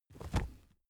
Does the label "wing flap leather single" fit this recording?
Yes